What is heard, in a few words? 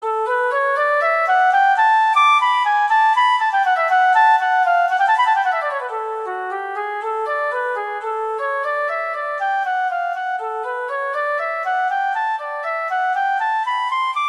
Adagio non troppo - Cadenza; Mozart; Concerto K313